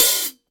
treble heavy open hi hat sound a key, Royality free open hat sound tuned to the A note.